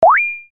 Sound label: pin